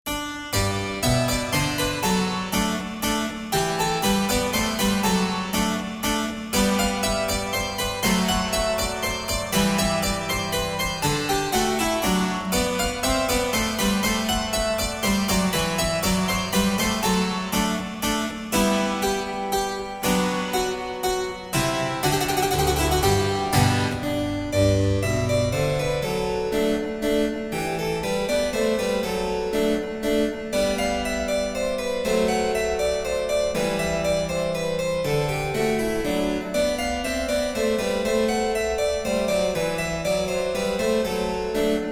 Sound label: Piano Pieces Minuet